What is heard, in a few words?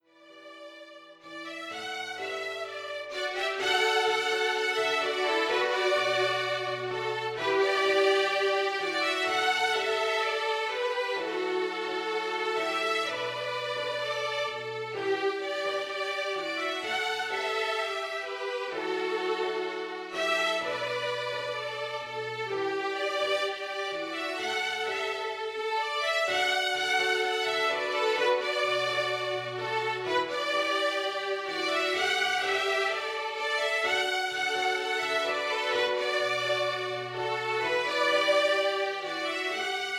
violin duet with piano accomp